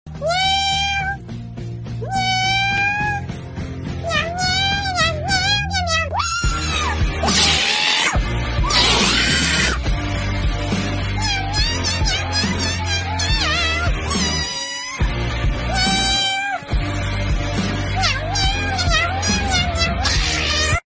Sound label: cat meows at 5am